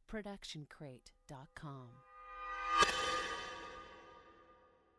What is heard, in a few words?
metallic reverse; soundscrate